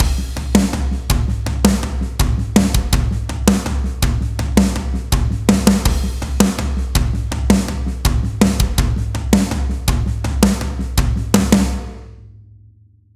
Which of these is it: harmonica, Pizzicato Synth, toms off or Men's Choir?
toms off